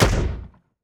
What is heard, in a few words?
Shotgun; GlobalGameJame